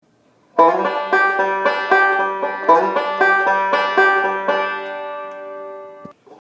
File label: lick (2-5 slide) variation